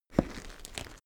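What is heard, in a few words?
step gritty stone step, footsteps; schritte; menschen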